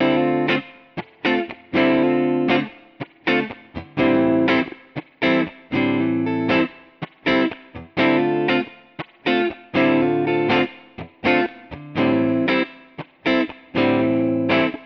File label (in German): Floorboards im Vergleich Clean Kemper